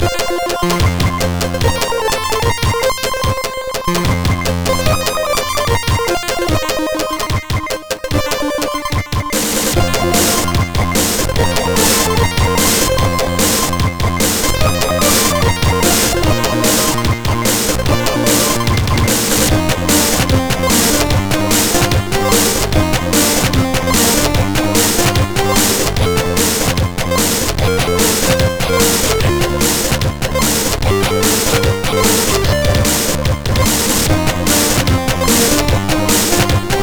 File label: MOS6581R2, sid